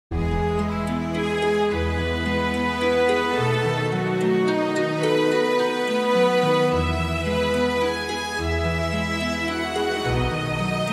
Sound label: lullaby